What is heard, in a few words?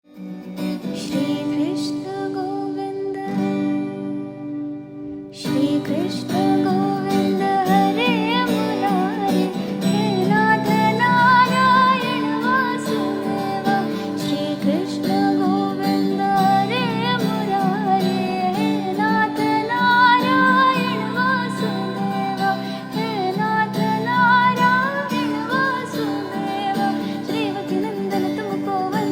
Female Version